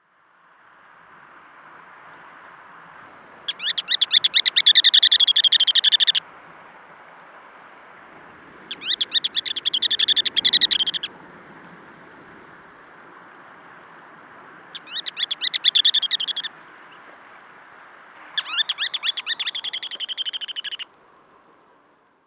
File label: ruddy turnstone